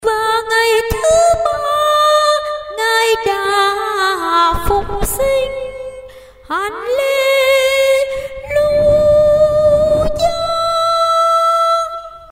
soprano

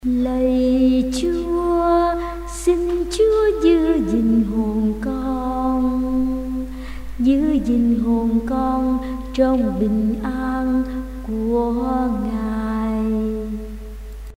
alto basso

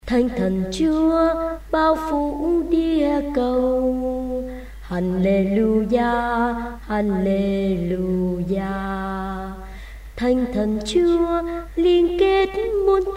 alto basso

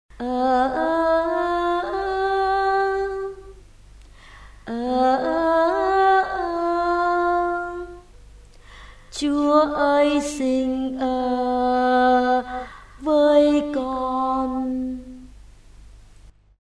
alto

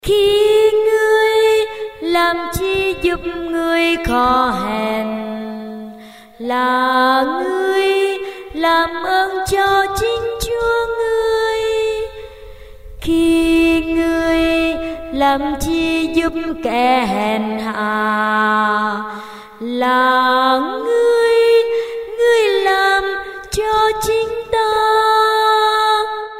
tenor basso

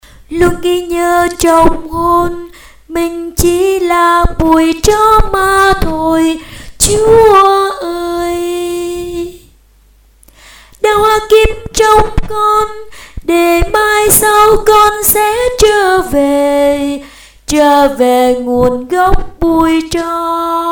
alto

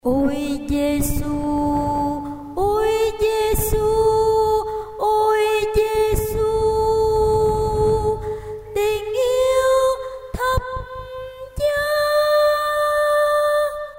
tenor

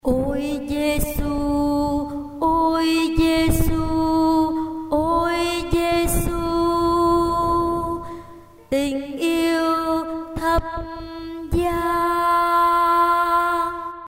alto

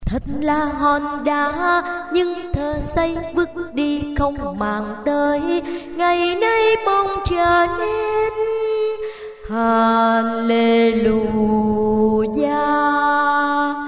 basso

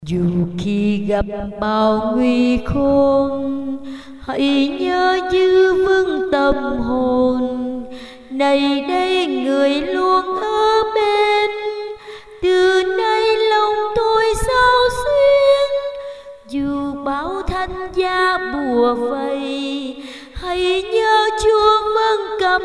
tenor basso

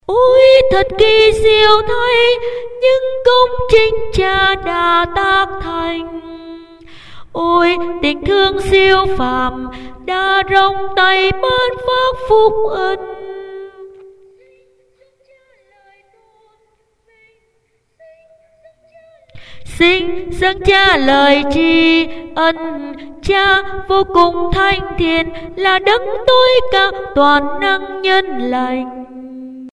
basso

